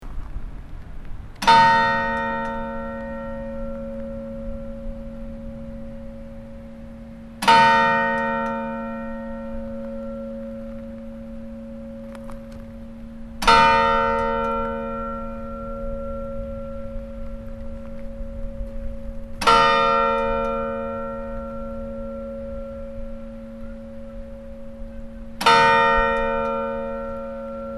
olafsvikurkirkja likhringing, Kirkjuklukkur; vesturlandsprofastsdaemi; Ólafsvíkurkirkja var vígð 19. nóvember 1967

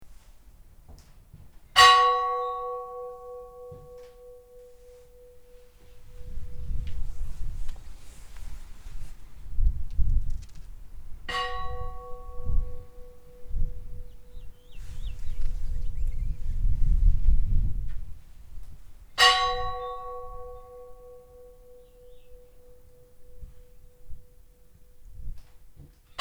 oddakirkja likhringing, kirkjuklukkur

